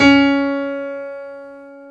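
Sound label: piano C#3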